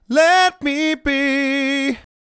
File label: Let Me Be, Male Vocals